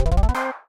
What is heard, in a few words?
Notification